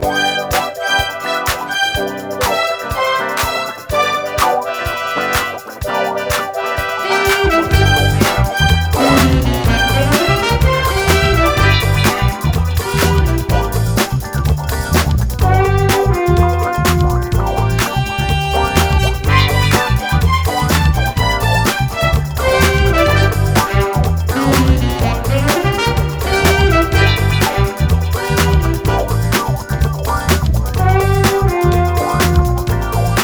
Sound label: Incidental BGM; Smeshariki Cartoon Studio